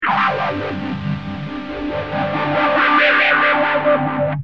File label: gtr mam rs3